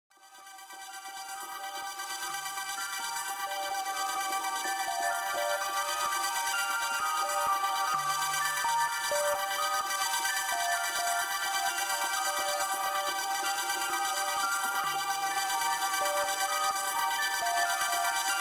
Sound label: shimmer